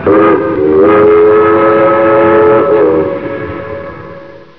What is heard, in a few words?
whistle